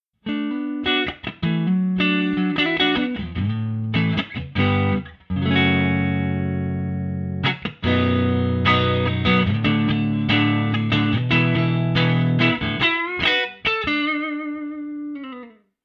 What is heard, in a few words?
clean